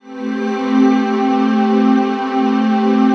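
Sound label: trance pad; Best Service; XX Large - Pads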